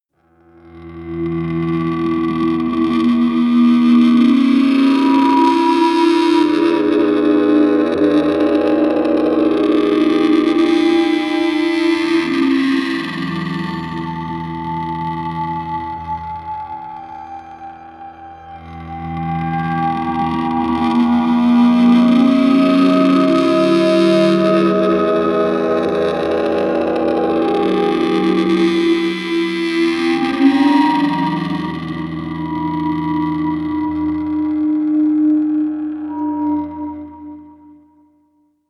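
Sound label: ghost ship, pads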